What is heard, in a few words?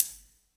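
snare drum rim shot; 90 s Sample CDs; Best Service Dance Mega Drums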